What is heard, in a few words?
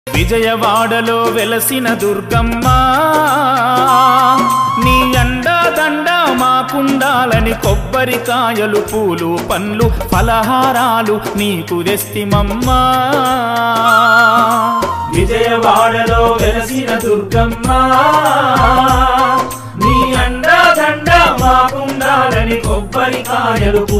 Durga Devi Devotional Songs, Telugu